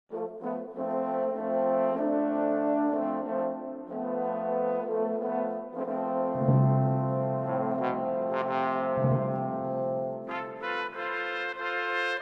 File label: Hymns For Organ, Brass